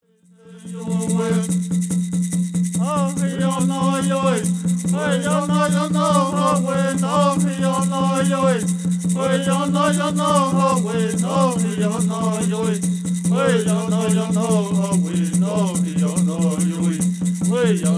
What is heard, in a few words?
Peyote Song